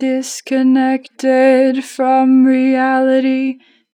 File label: Disconnected - 2 bar vibrato, Vocals, Natural Vibrato; Harmor Vocals